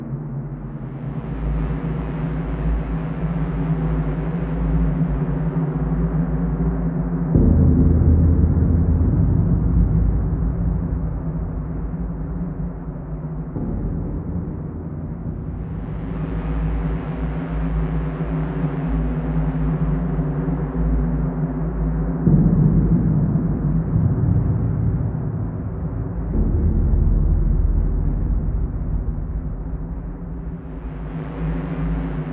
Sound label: Tilt Loop, Horror Atmospheres Music; HauntedBloodlines